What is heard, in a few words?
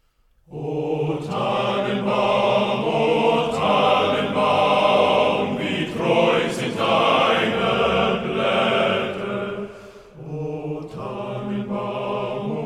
o tannenbaum german english army band